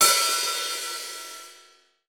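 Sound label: HH, hi-hat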